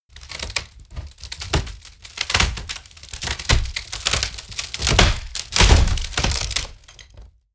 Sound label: wood break large; berufe; foerster